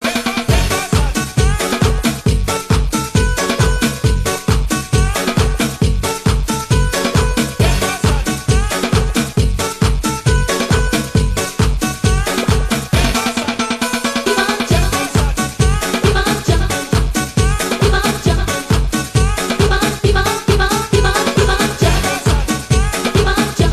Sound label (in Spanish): Ritmo Latino